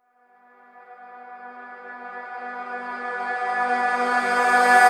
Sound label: Reverse Vocals Gmaj; Vengeance - Essential Clubsounds Vol.4; Effects